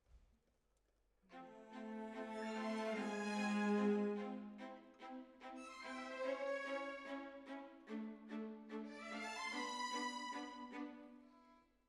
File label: Decca, Jazz for piano